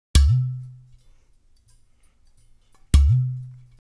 percussion loop

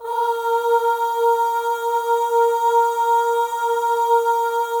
OH, M+F; Spectrasonics; Symphony of Voices; M+F OHS

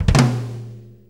6-8 Kit Flam; Drum Tools; Sonic Foundry (Sony Creative Software); Loops & Samples Drum Tools; Flams

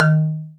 TUNED PERC; Greytsounds; EXOTIC PERC

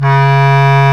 BSCLAR; Roland - LA Composer vol. 1; WOODWINDS

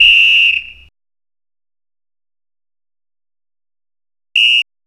Whistles; Sonic Foundry (Sony Creative Software); Club Joints and Street Anthems; Game Time

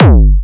kick drive

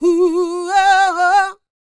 D BIT, Vocal R&B Dance

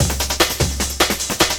LOOP, Drum & Bass, 150 BPM

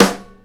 Snare, Drum Machines

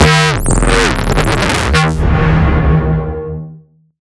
Weird Neuro, bass

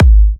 Kicks G#; markus hakala

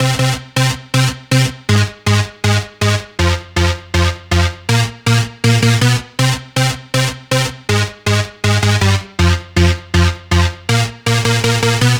Dance Synths, Hardcore Loops, Synth Loops

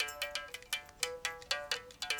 mouth bow, Africa; Roland LCDP11 Africa VOL-1; S.MouthB Phr

